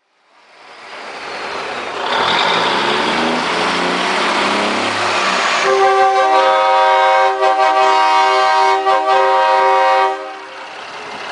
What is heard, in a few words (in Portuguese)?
buzina